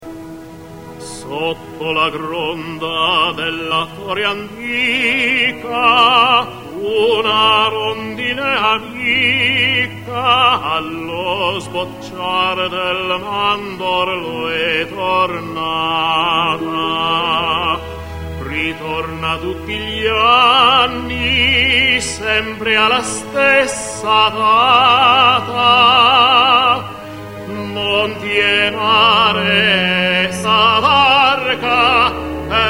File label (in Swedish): neopoletansk ballad; voy musik; doktorn